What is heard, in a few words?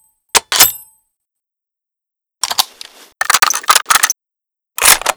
bolt reload